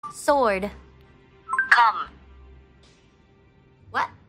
sword

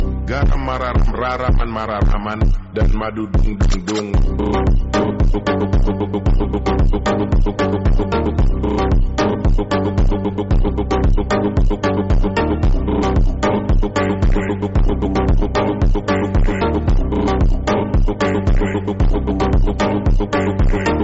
funk; garam

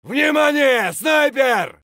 sniper scream; tarkov chvk vnimanie

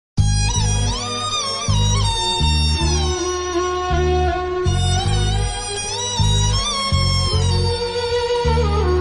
sad music bgm india ver

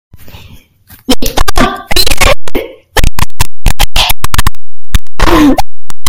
bass boosted

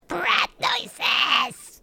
brat noises

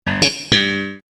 sitcom transition bass riff; seinfeld

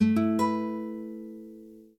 samsung s20 guitar

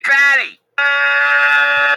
fatty aaaaaaaaaaaaaaaaaaah

